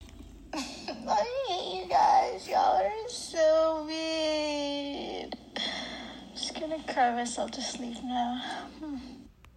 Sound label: Joy Crying Ringtone